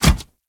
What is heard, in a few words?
Kubrow Step Metal, Footsteps; Warframe